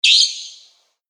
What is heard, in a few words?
night bird